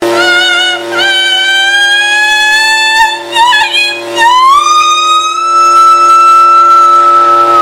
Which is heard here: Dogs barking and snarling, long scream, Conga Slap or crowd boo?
long scream